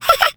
tickle; Idle Champions of the Forgotten Realms

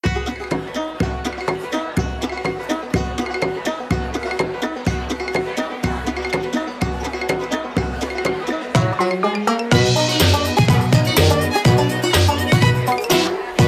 Skeletones Showdown; Skeletones Showdown 1 (Pipsqueak)

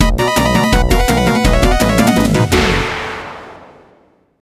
Stage Start Normal Game; bomberman party edition

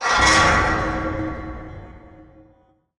Skill2 Magic; portal quest; android; Blue Mage

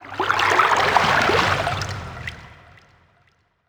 environment water calm distant

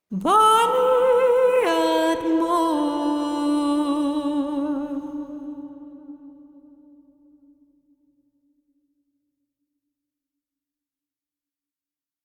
Exploration Vox; Novigrad

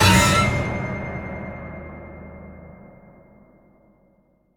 sting Success; the walking dead michonne 1 in too deep

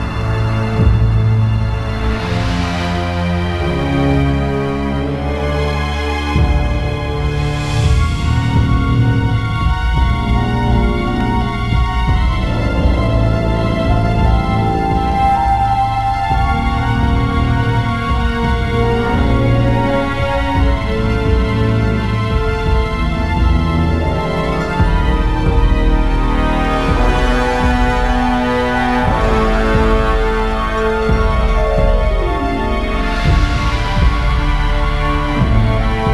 Music Low; game rip; Legend of the Guardians The Owls of Ga'Hoole; nds

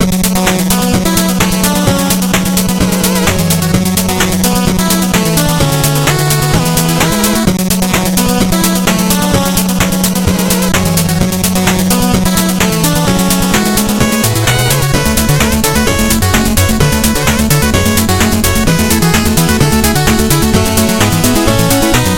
Music Level Lost City; Shovel Knight King of Cards

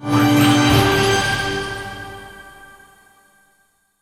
Hero Level Up; portal quest android

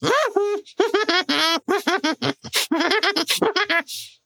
inmate grab

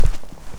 Footsteps Rug; Backrooms 2D

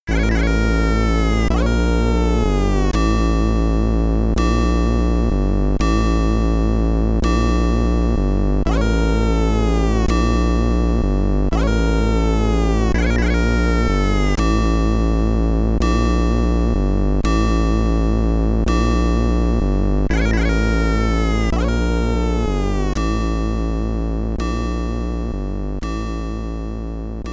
Boss Theme 3 (SID Stereo); hermetic; commodore 64

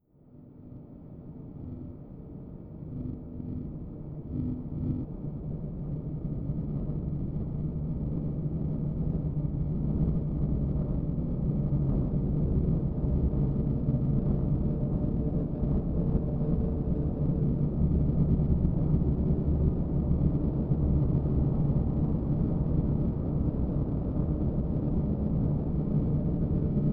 Slow Ambience; Hitman Codename 47; Hitman 2 Silent Assassin

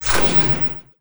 Cast Spell; Moleman Shaman

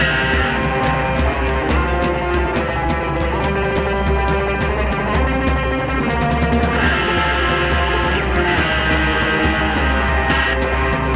Aggro Agg loop no bas; Outdoors Old

